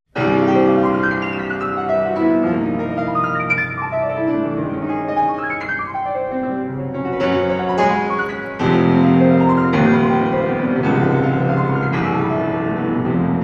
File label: Three Piano Pieces Etude